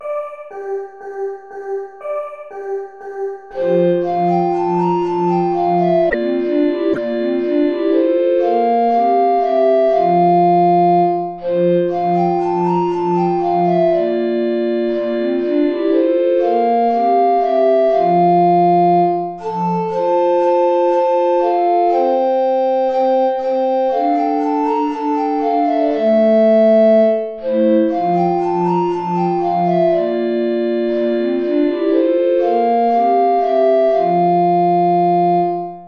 play along without tenor recorder; steuerlein johann; mit lieb bin ich umfangen

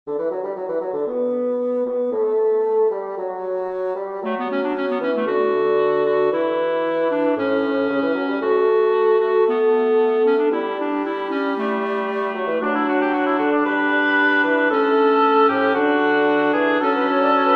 fugue major for woodwind quartet; bach johann sebastian

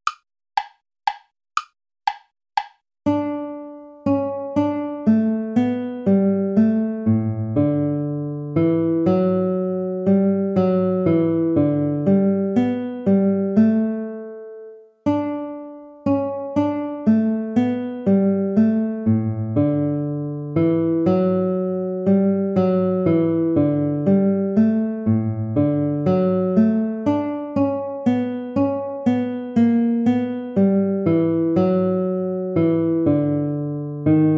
minuet play along

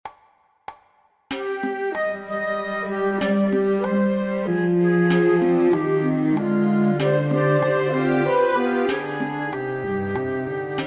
orchestral song

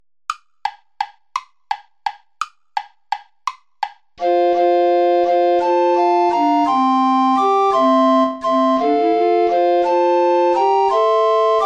play along without tenor recorder; praetorius michael dulci jubilo

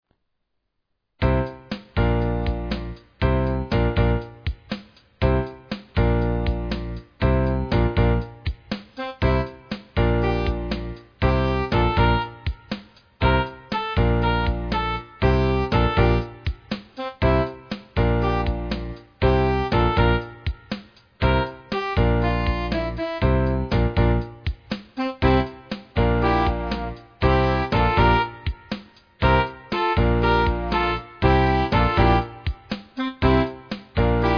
concert band rhythm section; raul romo; vamos broadway

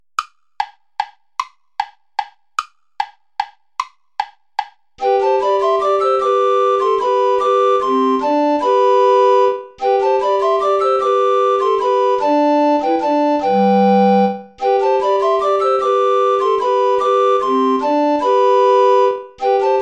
volta play along without alto recorder; praetorius michael

